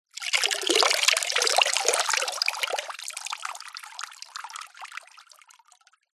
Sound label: nature water sudden fast drips into shallow water